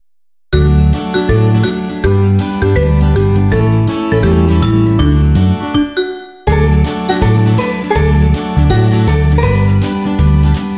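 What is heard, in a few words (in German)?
Auf der Wiese steht 'ne Kuh, Gitarre Melodie; Gitarre Akkorde Text Melodie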